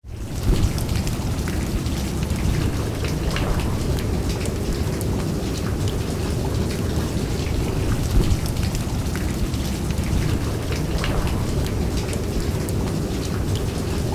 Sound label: acid rain; ambience